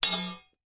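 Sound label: warfare sword hit another fight; zapsplat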